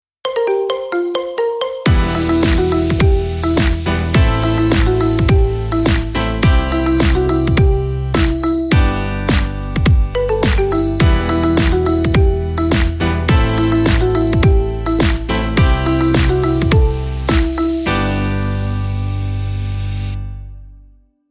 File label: Marimba